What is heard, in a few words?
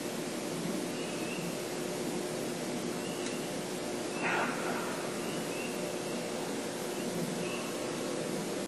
possible growl